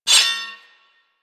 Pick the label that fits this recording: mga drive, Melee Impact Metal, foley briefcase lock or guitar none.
Melee Impact Metal